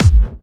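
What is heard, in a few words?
BD Clubby, bass drum; VEC1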